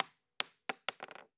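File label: bullet case bounce 50bmg wood hard; gmod; viper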